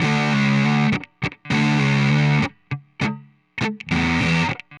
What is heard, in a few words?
Heavy Riff, Guitars